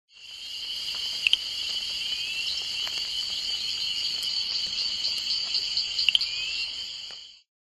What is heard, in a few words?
Boophis rappiodes